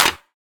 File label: clap D key; percussion; single hits one shots